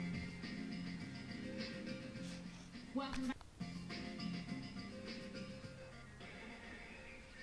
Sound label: music